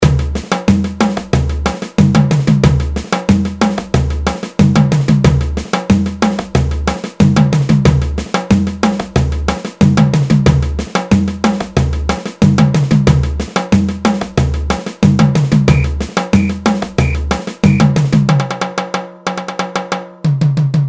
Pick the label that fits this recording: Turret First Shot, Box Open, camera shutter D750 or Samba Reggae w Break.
Samba Reggae w Break